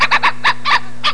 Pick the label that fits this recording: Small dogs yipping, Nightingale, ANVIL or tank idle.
Small dogs yipping